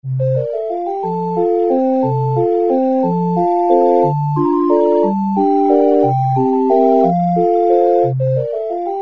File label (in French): glissando appog; acc2-3notes; fioritures